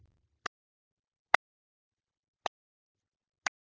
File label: clapping oracle; dereverb